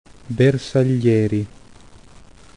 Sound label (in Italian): bersaglieri